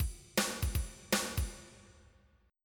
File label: swing